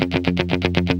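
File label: GUI 120, guitar; Best Service Gigapack 2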